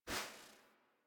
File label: UI Theme Window Close; Zephyr; Warframe